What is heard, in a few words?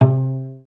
CLS PIZ C2, Orchestra; Best Service; Peter Siedlaczek's Advanced Orchestra